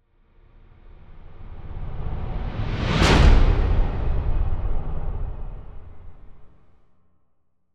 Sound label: Swell To Impact Flanged; bottlerocket